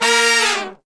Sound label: Horn Section Fall; Traditional; Sonic Foundry (Sony Creative Software); Jazz Solos and Sections